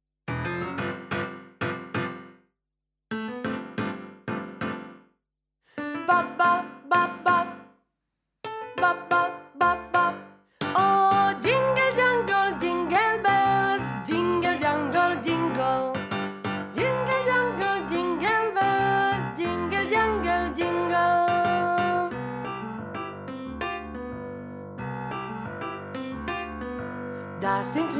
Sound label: ALTO; ADIOSES SHOW CHOIR; THE CHRISTMAS SHOW; JINGLE BELLS 2018